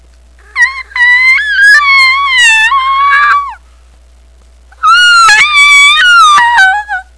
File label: squeal